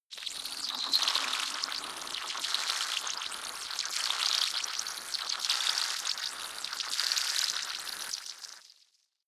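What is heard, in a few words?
water feature, science fiction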